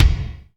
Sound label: Reverb Kick Snare; PS-37 Dance Synths; Percussion